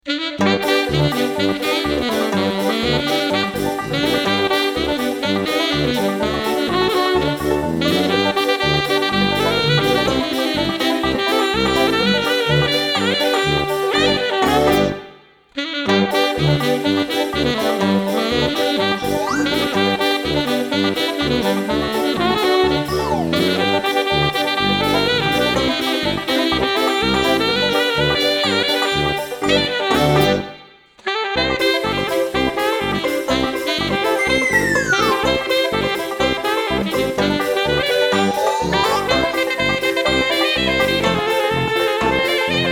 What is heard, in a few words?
Sax